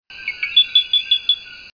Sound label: eleutherodactylus whitmanae maricao state forest puerto rico; series of metallic whistles; The call begins with one or two almost inaudible notes, then rises sharply before gradually descending in pitch and strength.; West Indian